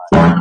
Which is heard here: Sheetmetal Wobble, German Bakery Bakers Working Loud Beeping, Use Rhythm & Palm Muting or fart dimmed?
fart dimmed